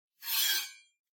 まな板にある包丁を取る音

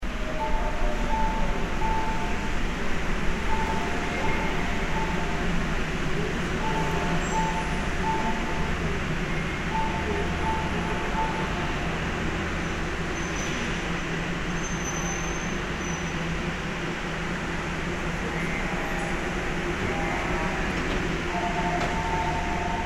電車に乗って発車する環境音

